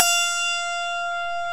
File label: AUTOHARP; OMI - Universe of Sounds